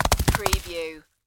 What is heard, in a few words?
Bullet Machine Gun Impact Earth